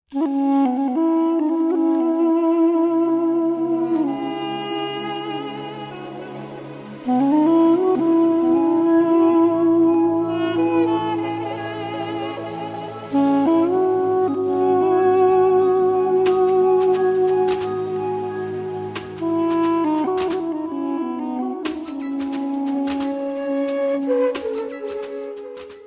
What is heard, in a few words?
Softy Flute